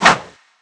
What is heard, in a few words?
sword bombard fly